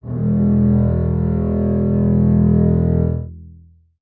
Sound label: string acoustic, cello